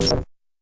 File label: fx drum